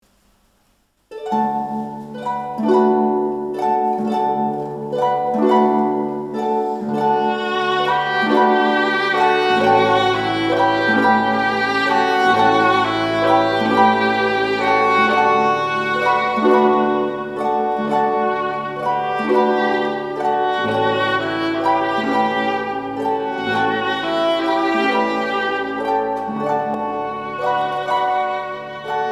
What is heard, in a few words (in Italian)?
Arpa & Violino